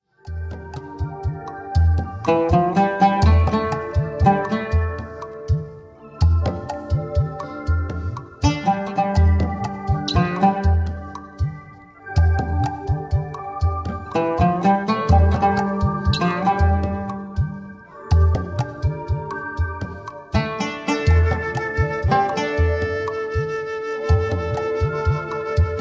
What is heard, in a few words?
City Instrumental